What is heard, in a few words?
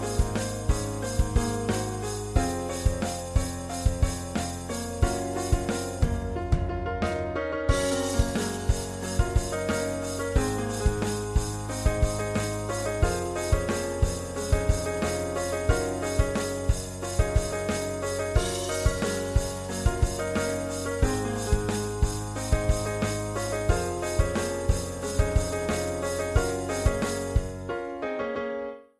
Rock